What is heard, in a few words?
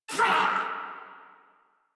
Death Crewmen; Warframe; Corpus Crewmen; Crewman D